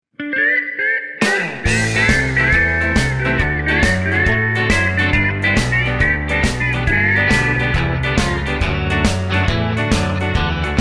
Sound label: G No Backing Vocals, backing tracks